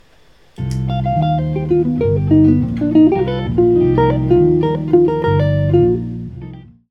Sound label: Lick; Gilad